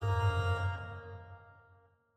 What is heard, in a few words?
instrument note